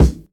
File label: dry steel kick drum sample c key, bass drum one shot tuned to the C note